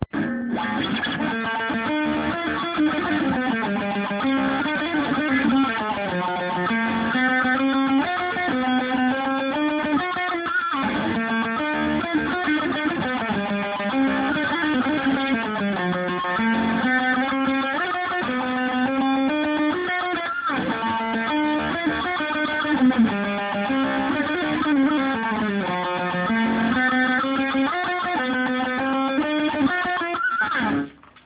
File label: melody